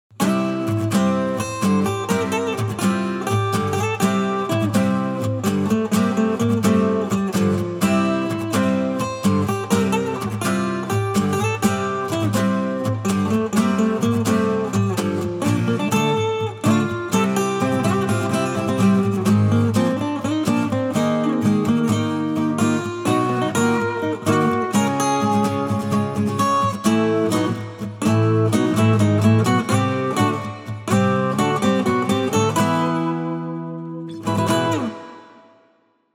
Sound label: Acoustic